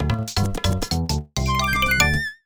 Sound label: Forest Raposa Collected